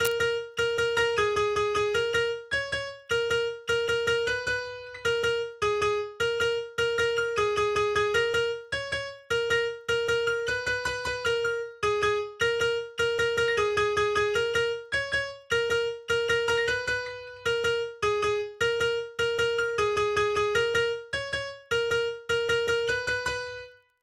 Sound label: Drop Synth WET NO SC